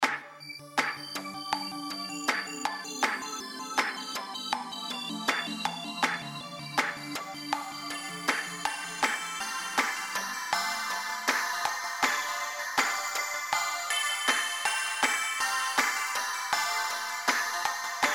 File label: D 80 Groove GTR impro